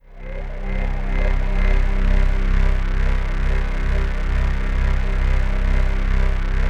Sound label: ATMOPAD; Best Service; XX Large; Pads